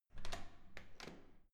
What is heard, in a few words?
security door open soft